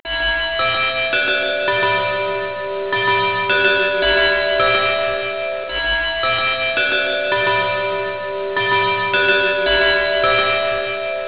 clock; halloween

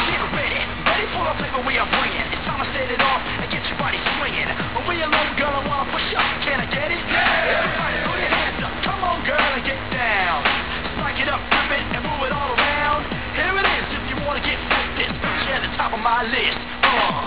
Rap

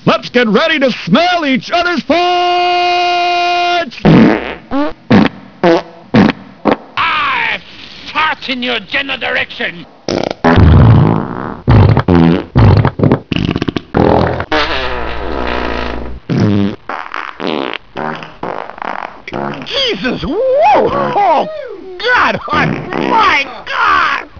fart fight

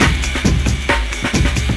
jungle

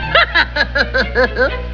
laugh; Wakko's giggle

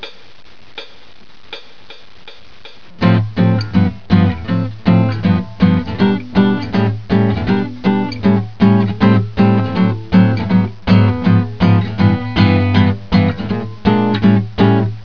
swing, Swing Chords

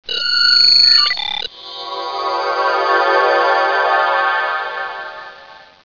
Transporter beam sound

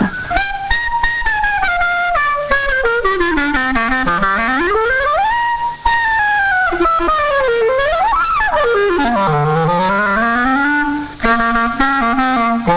jazz

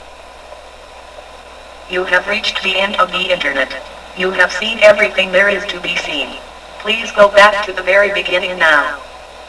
internet echo

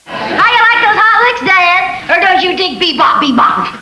dig bebop

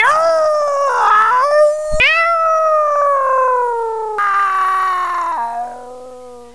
screamin cat; Yowling

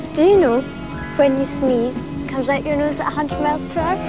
sneeze; Gregory's Girl

